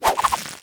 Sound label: vine Grab